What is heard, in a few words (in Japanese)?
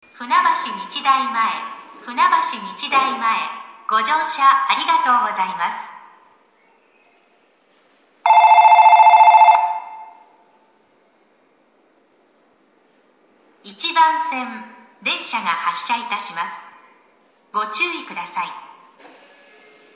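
駅放送, 到着発車 音量が大きい; 到着放送のタイミングが遅めです。収録には最適の環境です。; funabashi-nichidaimae; 2番線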